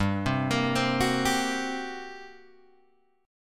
G Minor Major 7th Double Flat 5th, G, Guitar, Open G